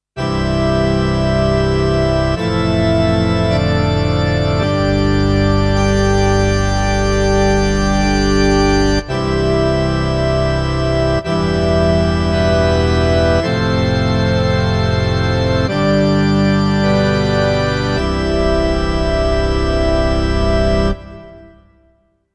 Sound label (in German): Korg D1 Orgel